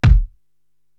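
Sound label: bass drum Brick Wall, Korg TR-Rack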